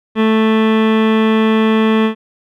Waveform Rectangle

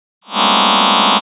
cluster closed semi G

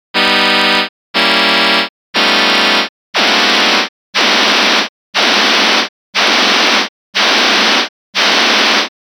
cluster pulse

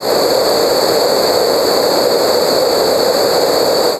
Noise Plosive K

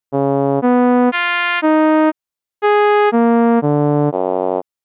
Envelope Spectra

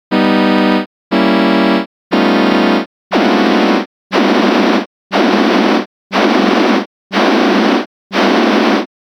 cluster sawtooth

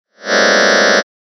cluster closed semi F

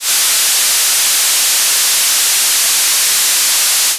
Noise Balanced

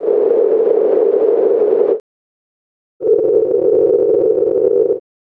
noise filtered vs modulated

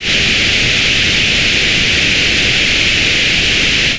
Noise Plosive P

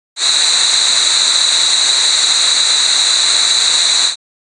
Noise Alveolar

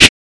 Noise Burst P

